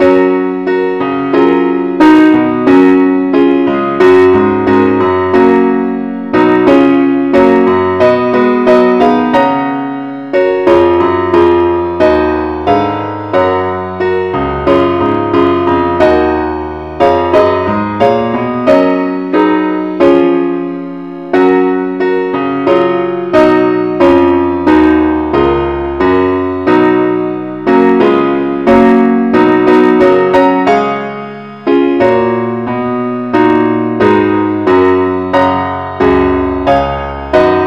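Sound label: keyboard only key g flat; christe Sanctorum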